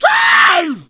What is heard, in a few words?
hunter pounce